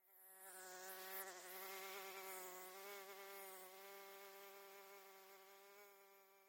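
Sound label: howler monkey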